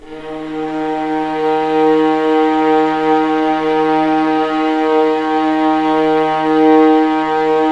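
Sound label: D#1 legato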